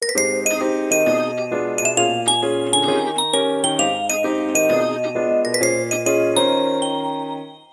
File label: French Chic, ringtones; Meitu; V4